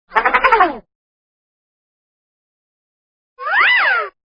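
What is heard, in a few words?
Double Take; cartoons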